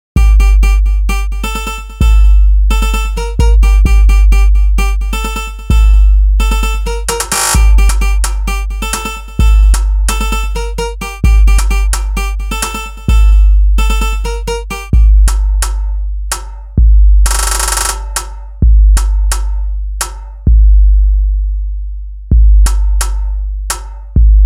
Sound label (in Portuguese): karaoke automatica